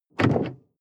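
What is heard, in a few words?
sports car interior trunk close hard; verkehr transport; autos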